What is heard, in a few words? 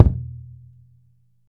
wet bass drum sample e key; kick drum samples